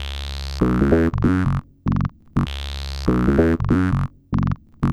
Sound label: Bassss; Sonic Foundry (Sony Creative Software); Synchro Funk